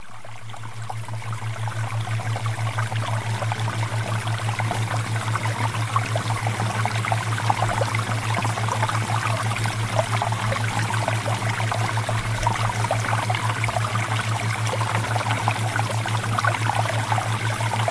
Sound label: Water Theta Waves; Premium Subliminals